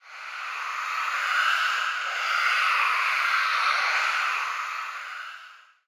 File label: heartbeat; superstriker